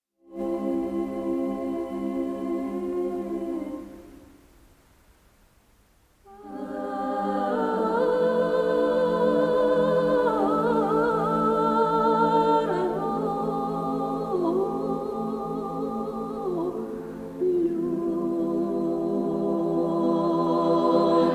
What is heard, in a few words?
female vocal choir; Bulgarian State Radio Television Female Vocal Choir